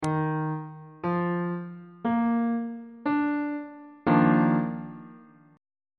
d sharp major 7th suspended 2nd chord on bass clef